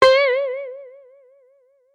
String, notifications; China Telecom

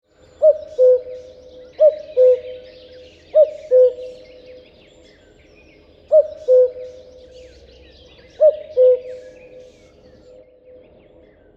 Cuckoo

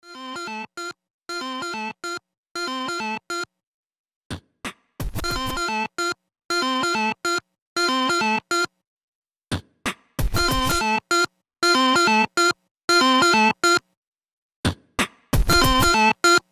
Blips; Sony Ericsson; W995 Alt

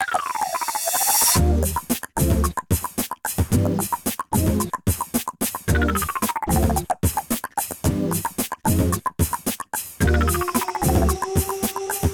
Bubble Beat, ringtones; Samsung; Galaxy M20

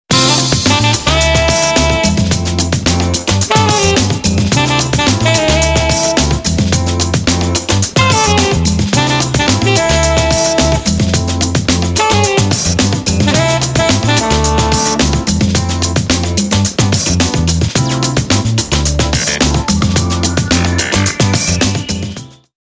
Jazztastic, Motorola W360

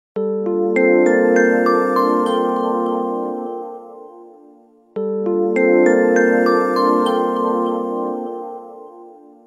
Shine

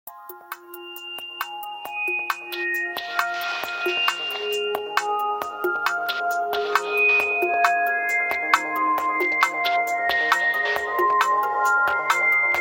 Clock alert; Nokia N96

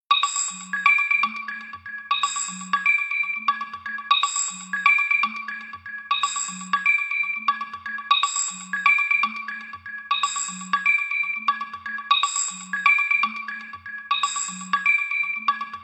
High Analog; Nokia; 8800 Arte; Ringtones

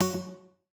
notifier digi; Mozilla; Boot2Gecko

